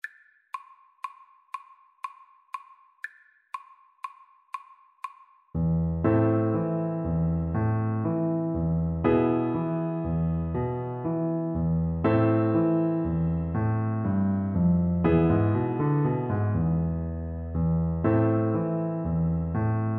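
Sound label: recorder karaoke; Hearts Ease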